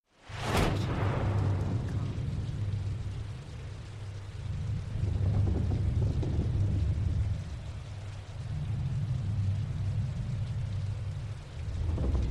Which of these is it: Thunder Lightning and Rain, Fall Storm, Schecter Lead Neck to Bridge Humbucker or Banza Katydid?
Thunder Lightning and Rain